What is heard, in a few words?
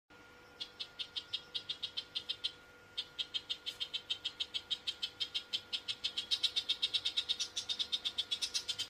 Woodchat shrike